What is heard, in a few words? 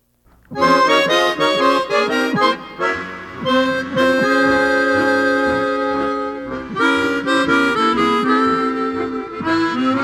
Harmonica; Legacy audio (live performers)